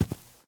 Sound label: grass walk